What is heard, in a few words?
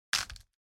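broken bone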